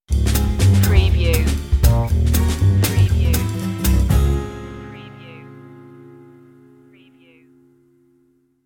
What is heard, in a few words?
manhattan hop stinger